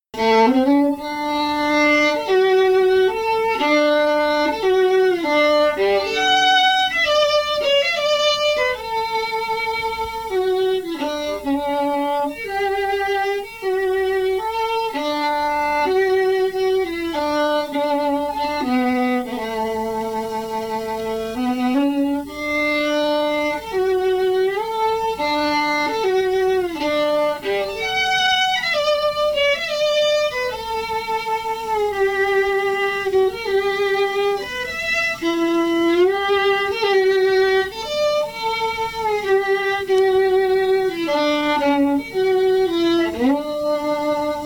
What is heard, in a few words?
fiddle; SeamusGrantFestival; tune tutor